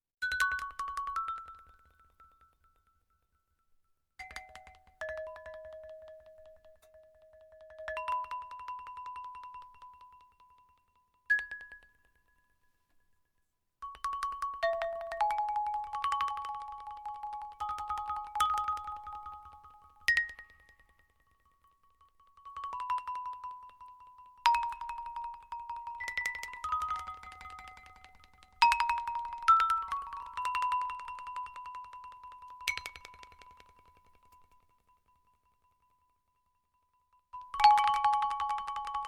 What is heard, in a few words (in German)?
lithophon